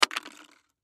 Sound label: Can Crush Throw, Can Crush Throw Slide Cement Aluminum Empty; Foley; Blastwave